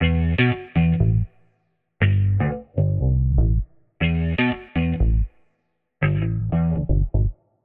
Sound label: 120 F, Warped Bass; Loops; In Your Space; Touch Loops; CosmicFunk